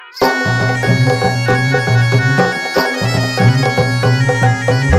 Dhol Damou

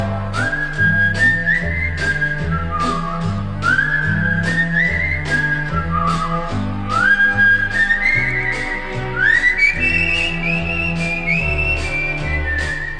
whistle, ringtone

